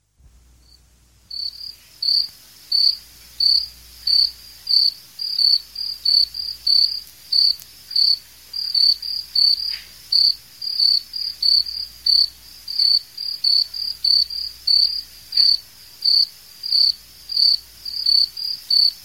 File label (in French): bruitage grillons